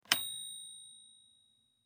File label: correct; spider drop spelling game